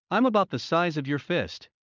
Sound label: Heart mid; Classroom humanbody